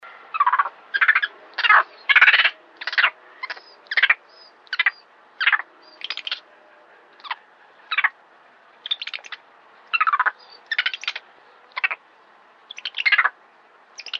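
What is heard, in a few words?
monkey sound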